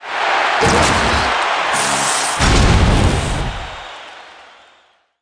robot rumble studio; rise of the robots

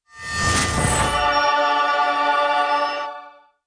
monster fight; thoh16; rev lovejoy

